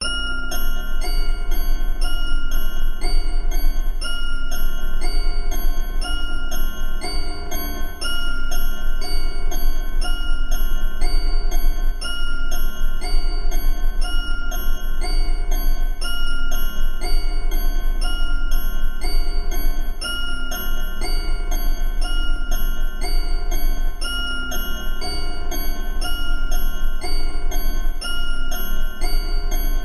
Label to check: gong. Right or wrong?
Wrong